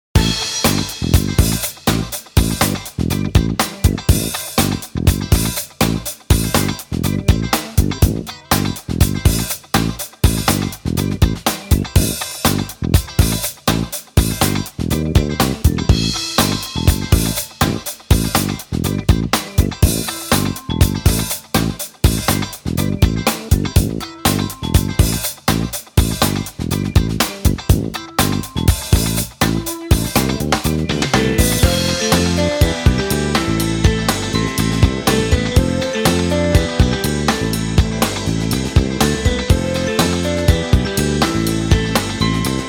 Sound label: funk